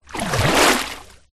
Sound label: nature water movement